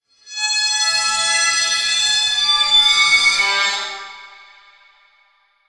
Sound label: Horrifying Squeaking; SingatureFX